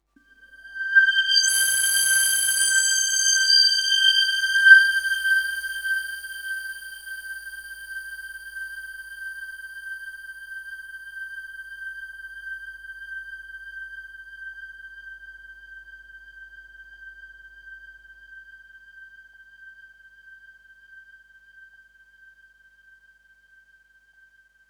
Oneshot Synth

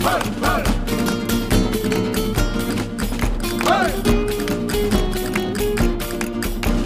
music world music shooter swarm

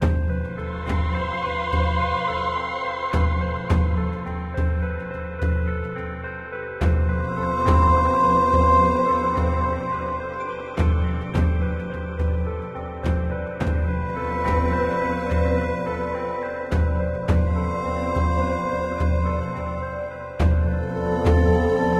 sirens battle; battlevoid harbinger

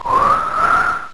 Icy Wind; pokemon reborn e19 2022

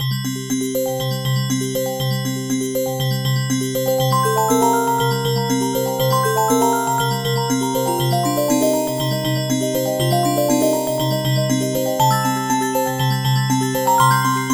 Underground (Fast); New Super Mario Bros. 2; World 6; Gold Ring layer

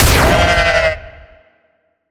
Goat Laser; A Dance of Fire and Ice

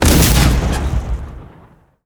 Explosions Destruction of War Machines

